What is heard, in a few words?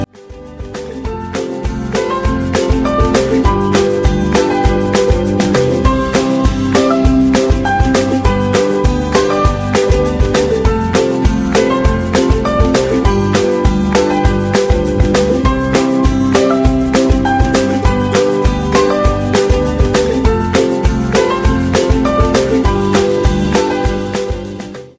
music